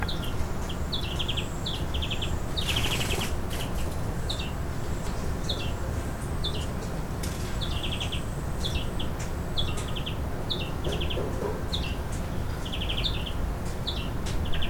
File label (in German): Gruenfink; Muenchen M; Michle Peron